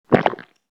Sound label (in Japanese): gokkun, ゴックン音のみ; 射精; 膣内射精